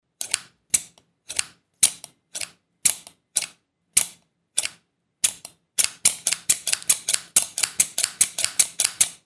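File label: scissors sound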